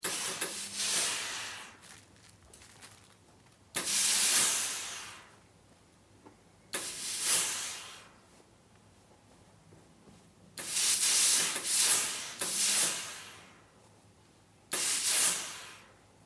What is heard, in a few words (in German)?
Dampfbuegelmaschine